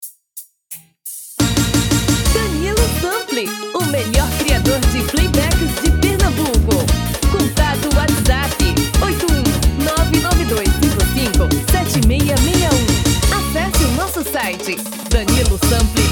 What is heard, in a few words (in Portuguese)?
LEVADA tom feminino